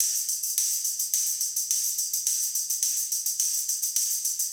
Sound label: Garage Funk Tambourine, 70s Analog Funk; Sonic Foundry (Sony Creative Software); What it Is!